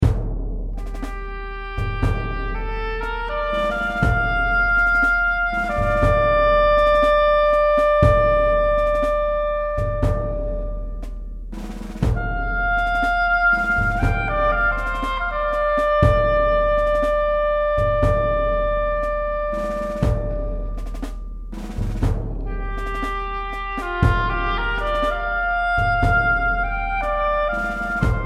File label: the stream oboe solo and drums